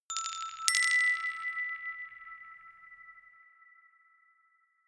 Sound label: notification glockenspiel rattle fifth up